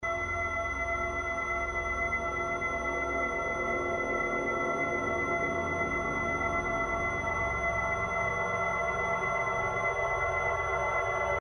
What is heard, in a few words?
drone